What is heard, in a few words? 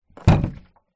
foley plastic water tank small down on ground water inside; zapsplat